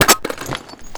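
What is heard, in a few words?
weapon foley cheytech reload clipout; Call of Duty Modern Warfare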